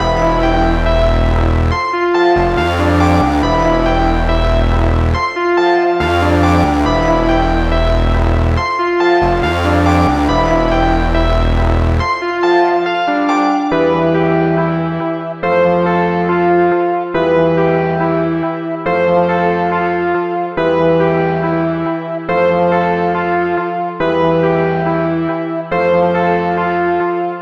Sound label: 140 BPM E Min, Synth Loops; ARP+JU60+P5; Heat; Cymatics - Fantasy Synth Sample Pack